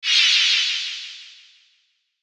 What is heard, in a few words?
Whoosh Small Star Fly By; Blastwave